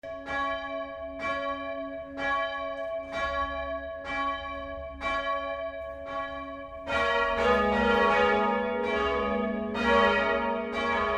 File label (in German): glocken oberwambach